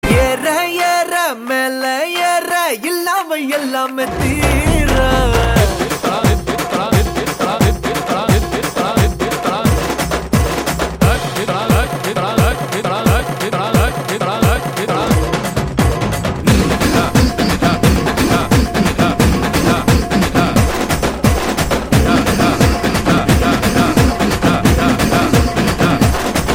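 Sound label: background music Ringtone; Suriya; Etharkkum Thunindhavan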